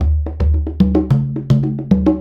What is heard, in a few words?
Congas; Essential Percussion